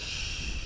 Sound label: thruster; das Triebwerk; csmoonhack